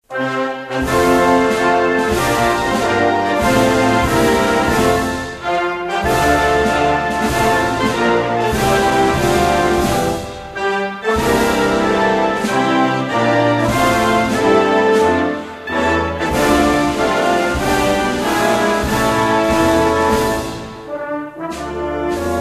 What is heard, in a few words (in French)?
hymne congo Brazza